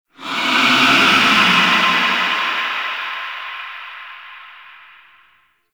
Use Ability Verb; Enemies; Warframe; Tau; Sentient Dactolyst

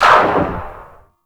Electro Mag Tail B, Corpus weapons; Warframe

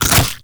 Nunchuck Unequip; Warframe; Infested; weapons

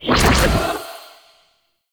Rift Exit; Warframe; Magician

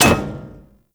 Ship Hit; RailJack Hit Effects

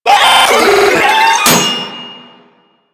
Proto Infested Volatile Runner Explosion Warning; Enemies; Abilities; Warframe

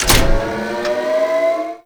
Asteroid Drill Machine Pull Start; Warframe; Grineer Asteroid

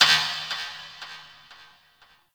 Ship Energy Knock; Warframe; Corpus Ship Remaster

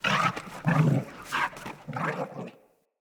Pack Wolf Run Grunt; Animals

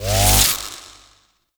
Experiment Rifle Charge Up, Weapons; Warframe; Corpus; Sent Experimental Riffle

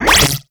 Stop; Warframe; Weapons; Spectra

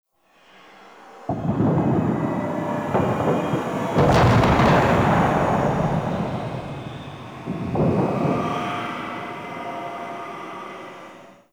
Intro Cinematic SFX; Alad V

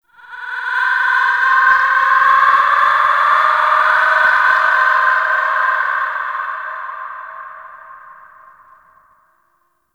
Void Ghost Capture Started; Void Tower

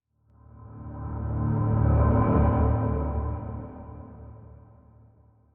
Transition Lead In; Entrati; TennoCon 2023; Quest Titan

